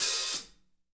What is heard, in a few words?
HAT OP CL, drum kits